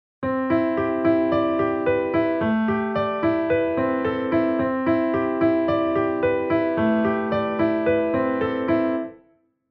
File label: arpeggio